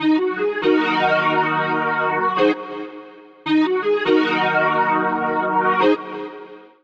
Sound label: Pad (Eb) 140bpm; Music Loops; Thunder Coast Pad; Ableton; Factory Packs; Singularities